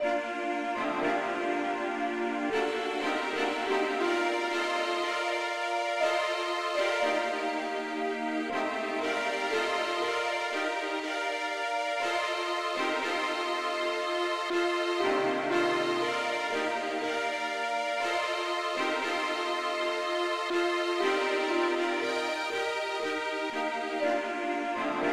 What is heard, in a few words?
uke lead